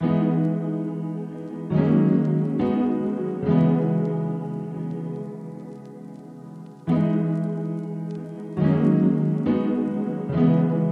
Calming Vocales

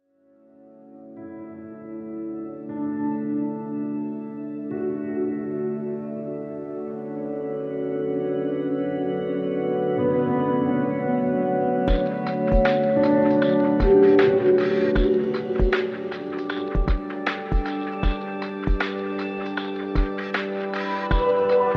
oriental soundscapes

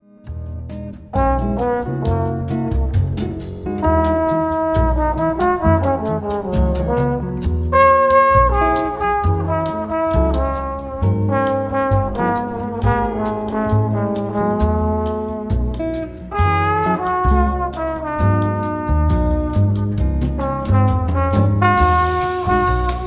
nocturnal jazz melodies

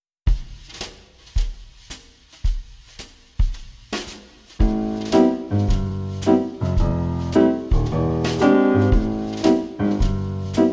swing jazz Swing 432 hz

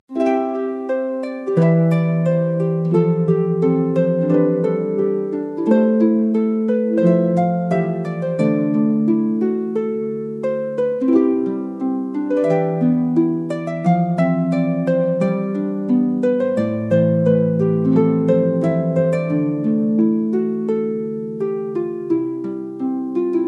celtic harp

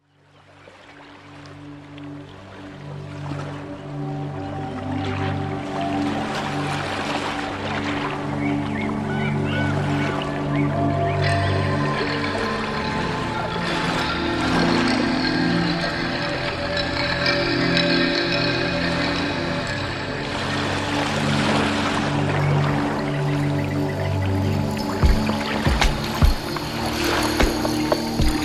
oriental soundscapes

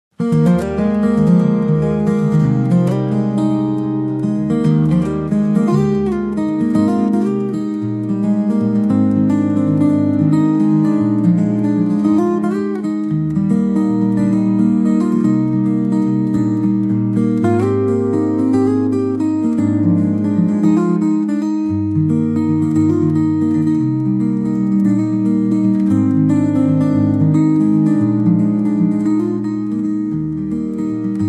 guitar

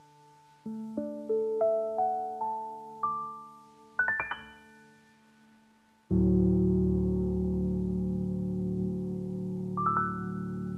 piano Calm Waves

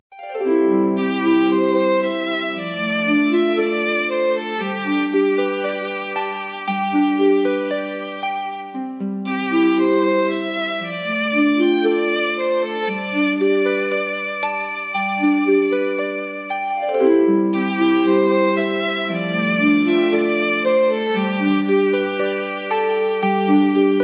celtic harp

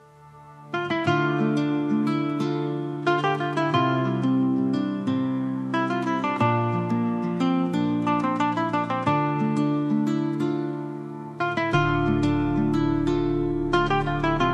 Asian Rhythms

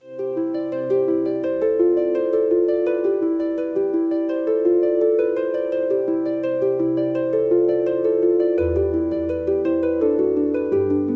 celtic harp

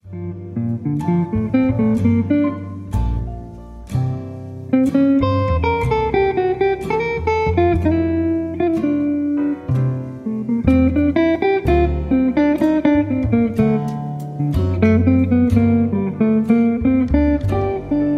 jazzy improvisations, Jazz Freestyle

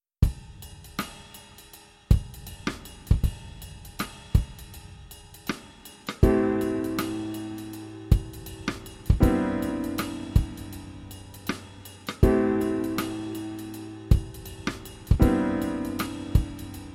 swing jazz 432 hz

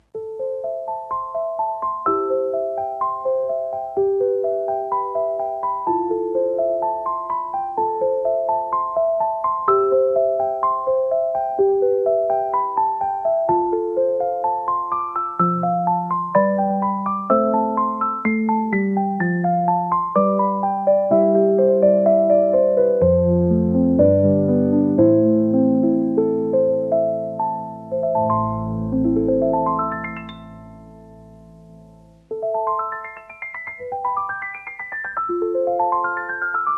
silent piano

